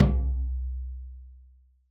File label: bumba low drum